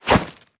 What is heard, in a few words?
melee attack knife; viper